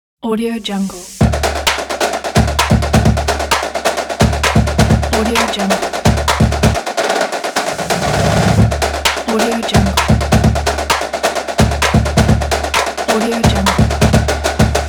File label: drumline sports event logo